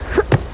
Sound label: jump; openffa; bart